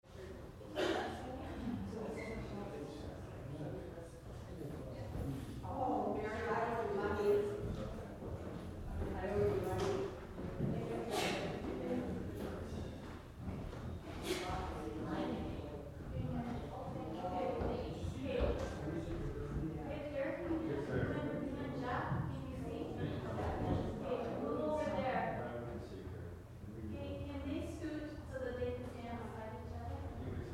Crowd Church Choir Chat Roomy; Crowds; Backgrounds

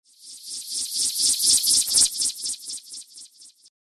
Mage Spinning Air Trick, magic sound effect; Royalty free fantasy sound effect - excellent sfx for role playing games, magic videogames; Andrew Fleming

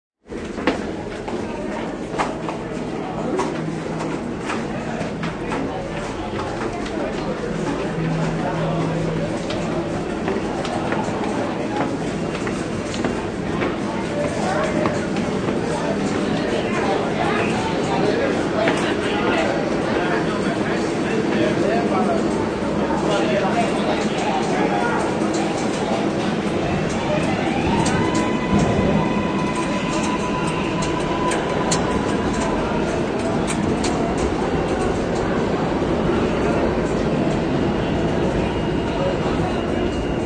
Crowd walking out of subway station; Exiting Subway Station; Train Stations

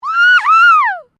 FEMALE SCREAM WOO HOO; Female vocal sounds; Screaming; Voice

